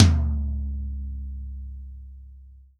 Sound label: REAL DRUMS POP KIT